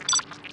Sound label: launch Menu Over; Tribes2